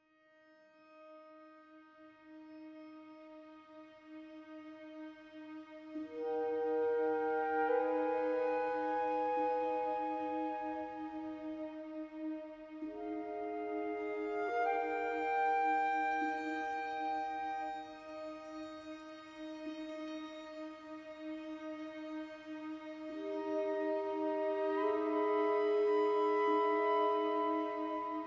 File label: 70bpm 4-4; Planet of Lana; Crashed Pod Full Mix